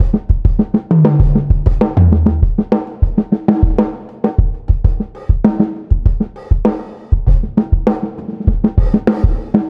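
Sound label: Drums Loop; Type:Rider; PS Vita; Futura Drums Lp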